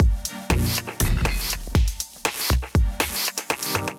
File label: Kit, drums; Maxi Lachs Kit; Ableton; Factory Packs; Skitter and Step